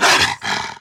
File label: wolf struck; mir2; crystal